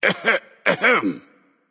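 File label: nonverbal clear throat; smite; voicepack; merlin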